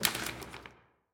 Stickie Rollover; Bureaucracy StickieRollover

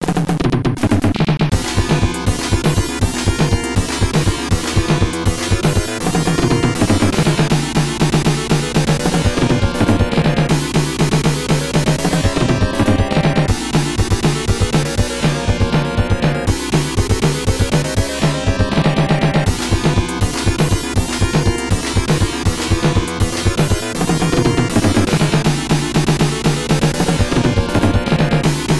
Battle; Zan Yasha Enbukyoku; PC-8801; Battle Phase 4

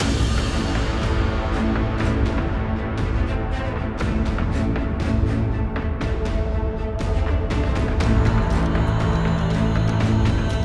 Fighting Phase; Nebulous Fleet Command

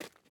ice step; teardown; ps5 windows xbox series xs

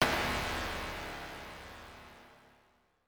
Shock; pc-9801

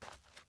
dirt step; teardown ps5 windows xbox series xs gamerip 2022

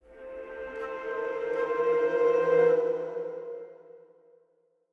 Scary Stinger; Sogport

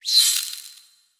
Card Token Break

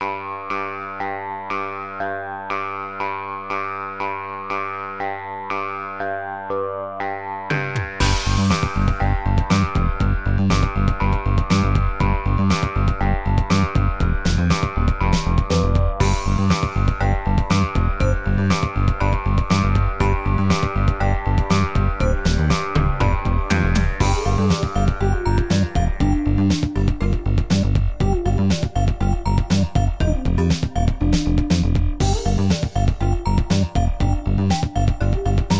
forest; Below Kryll

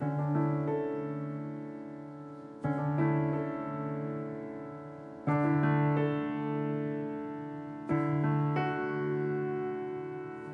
Music Level Memories

